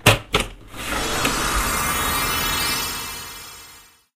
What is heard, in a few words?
Unlock Chest; Pirates Of Treasure Island